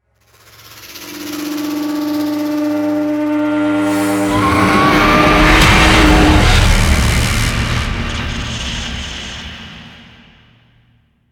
Beastie Scream; Ninja Tracks

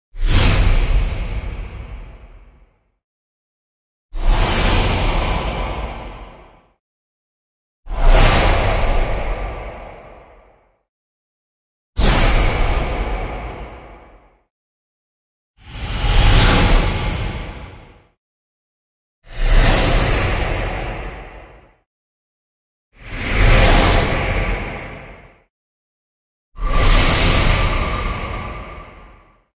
Swooshes (Fast); Epic Tools vol. 1

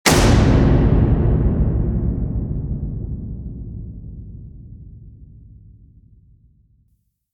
Sucker Punch; Gothic Storm Music; Brain Warp (Sound Design Trailer Tools)

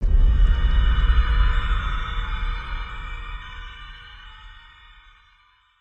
Hit FX Hollow Space; Brand X Music; Brand X Music Catalogue - Editor's Essentials

